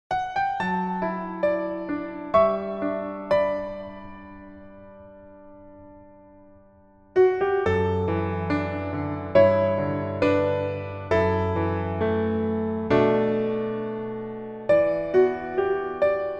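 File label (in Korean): Piano version 멜로디 MR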